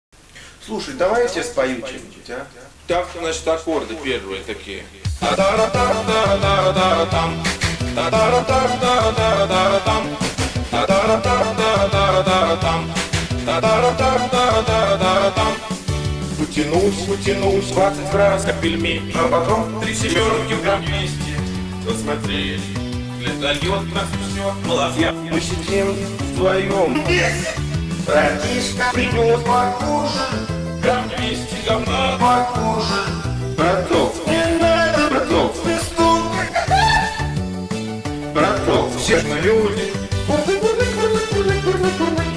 music

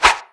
miss; diekrypta; cbar

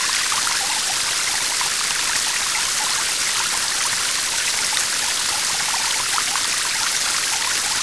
Shower

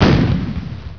GUN CANN

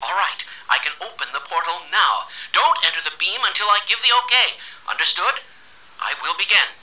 portal begin

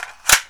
push, weapons; ar1

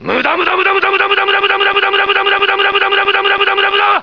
loop, weapons

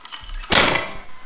key break

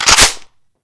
psg bolt

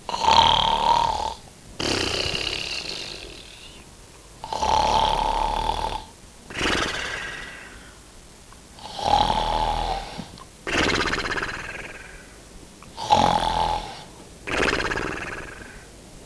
breathe

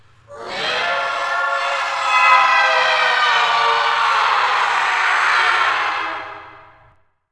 skull shriek

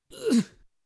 police pain

